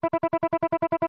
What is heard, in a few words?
Computer Working, beeps & tones